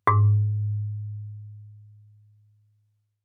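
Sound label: Marimba rubber fortissimo Ab4; MIS Pitches - 2014; Percussion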